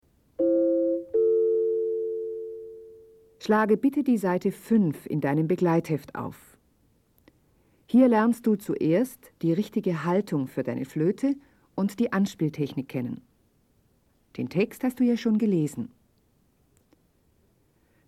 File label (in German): Spiel Blockfloete